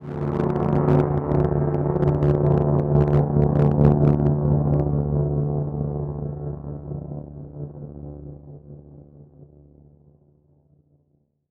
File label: Title Transition Stab Dark Mysterious; Production Element